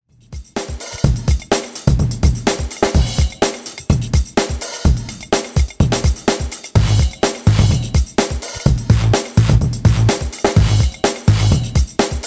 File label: funk repeater; runtime 60, 90sec, & 2min